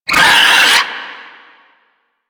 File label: Swarm Vocal